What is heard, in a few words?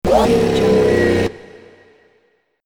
robot power drain